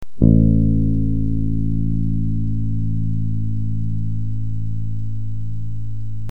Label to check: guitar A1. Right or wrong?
Right